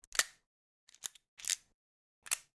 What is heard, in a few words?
Revolver Reload Break